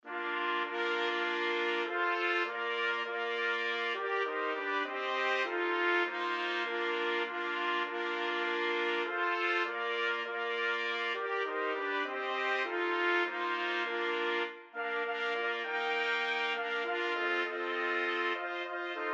christmas trees trumpet quartet

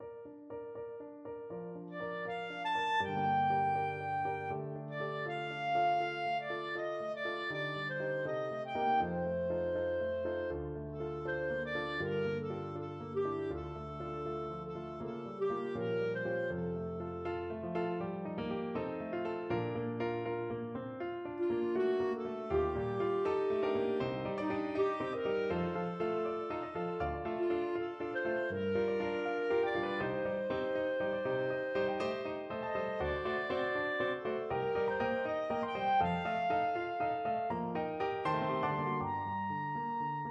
its peaceful here, clarinet

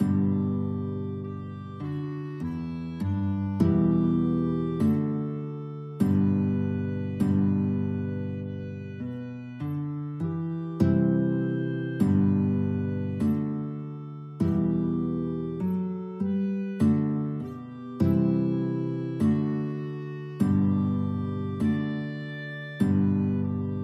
guitar clarinet duet; all people that on earth

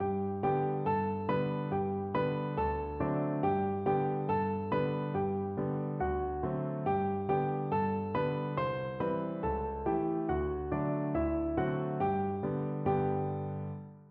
yankeedoodle piano duet

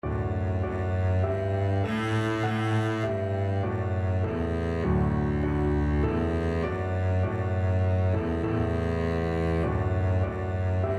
beethoven ode to joy double bass

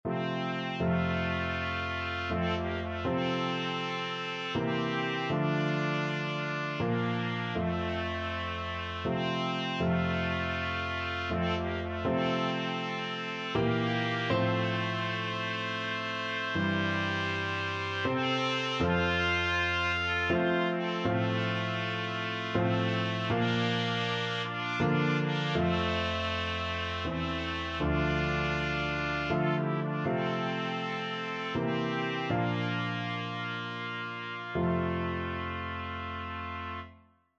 amazing grace 2 trumpet; trumpet duet

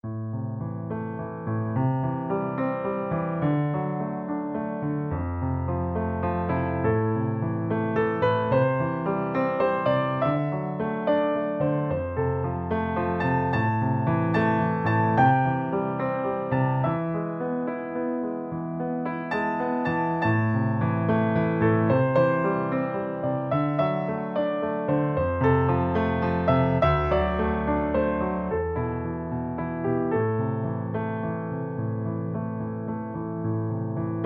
House of the rising sun, piano

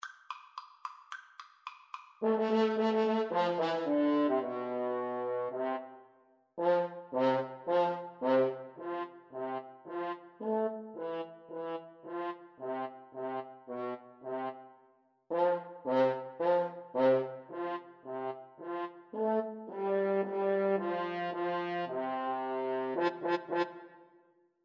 radetsky march, French Horn Duet

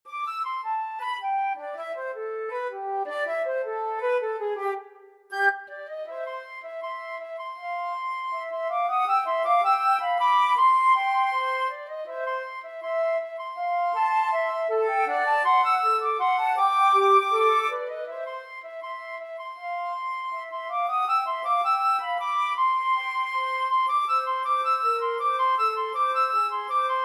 the entertainer, flute duet

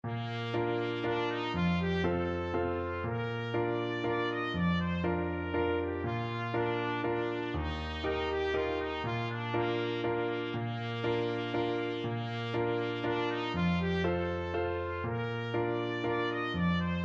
planxty hewlett trumpet

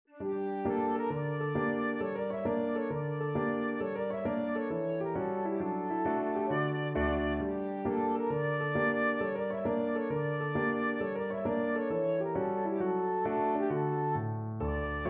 sasino kolo, flute

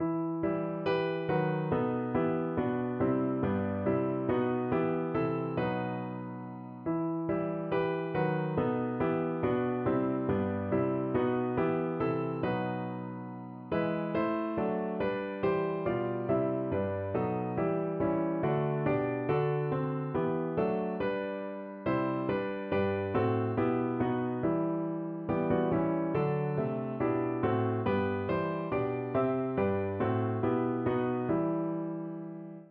piano

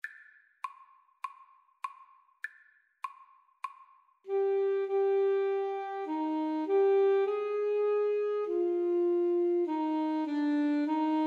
clarinet saxophone duet

